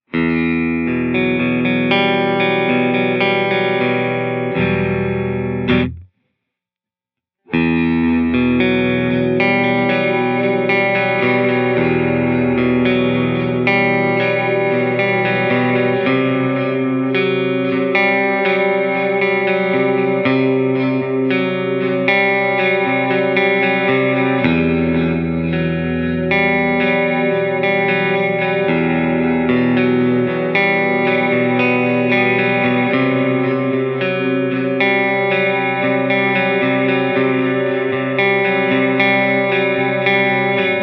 Filter Swhoosh Echo

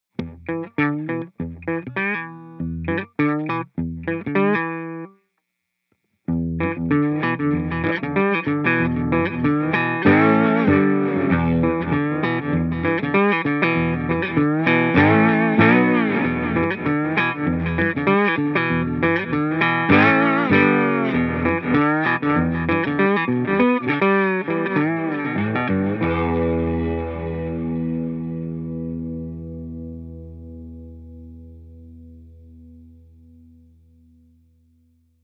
Digital Dynamic Duck

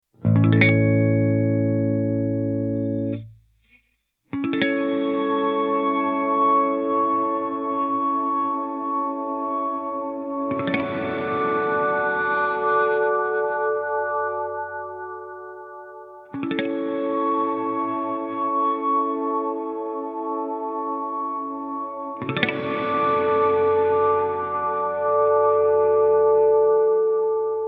Ambient Swell Baritone Harmonic, reverb

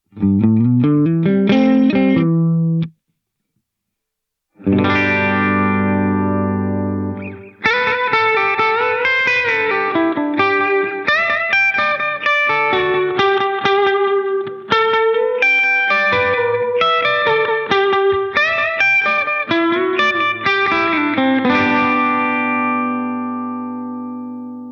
Delay Single Tap Solo Style

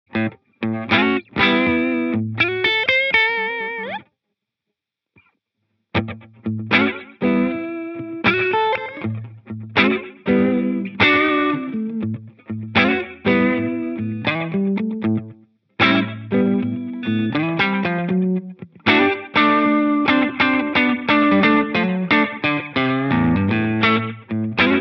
Analog Deluxe Memory Boy

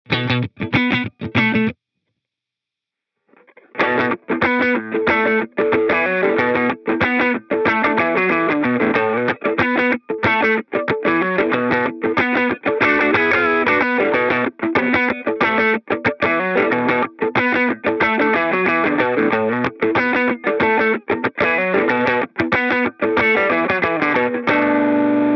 Lofi; echosystem

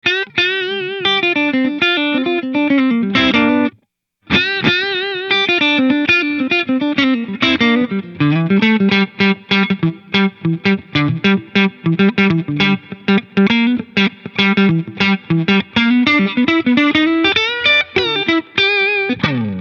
Hall Concert Noodling, reverb

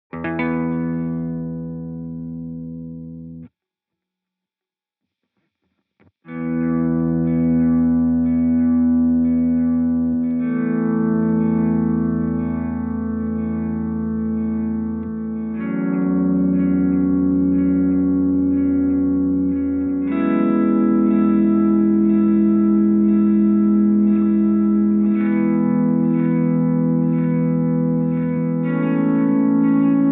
Mod Panning Delay

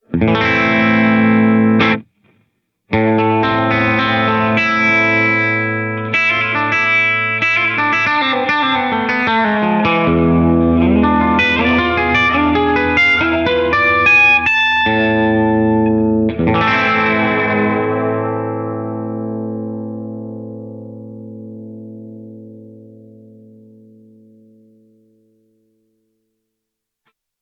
Spring Overdriven Western, reverb

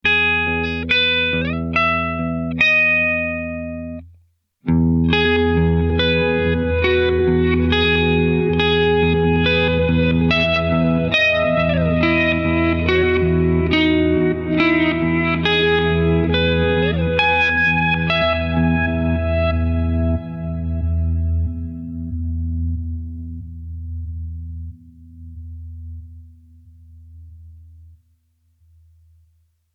Reverse Delay Drone

